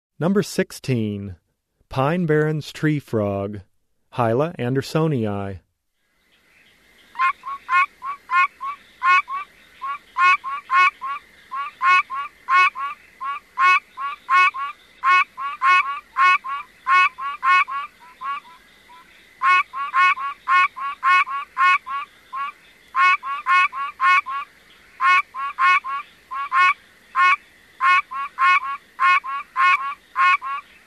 Hyla andersonii - Pine Barrens Treefrog, Frog Calls; Herpetology; Bio227 - Herpetology